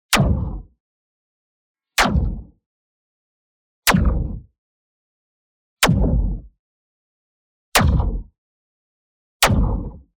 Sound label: IMPACT MEDIUM Sharp Shield; boom library; Matter